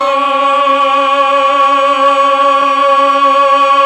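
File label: Mellotron Choir C5; Best Service Gigapack 1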